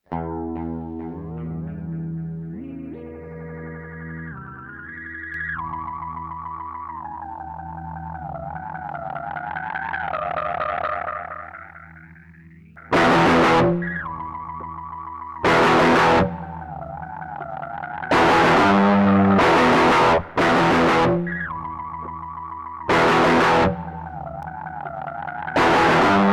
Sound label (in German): ditto looper test, gitarre